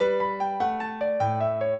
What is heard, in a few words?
trio, piano; Mozart